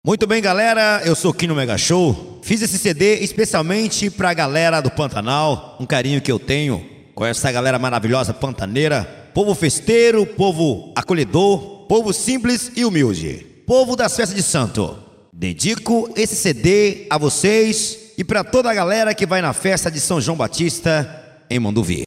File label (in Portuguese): sertanejo vinheta